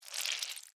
Larva Grub Move, Juicy, Wet, Slimy, Short, Insect, Bug; Animal